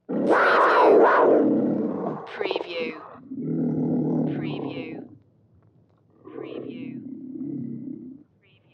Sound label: Leopard Black Snarl Growl